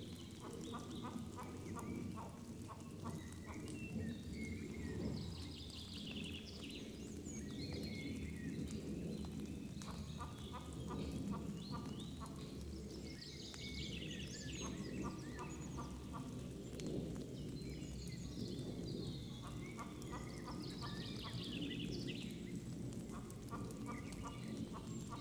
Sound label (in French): Poule Grand tétras avion; biophonia; suivi avifaune